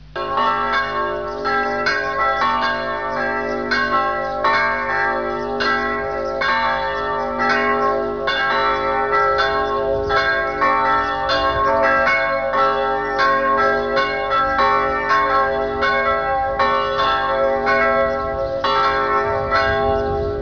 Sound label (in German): Kirchenglocken; Kleinbetschkerek